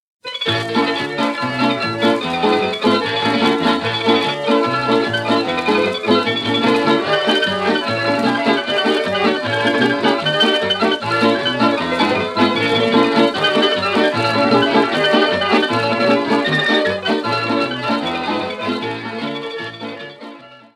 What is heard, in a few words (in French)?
Kolo, Croatie